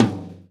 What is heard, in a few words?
tom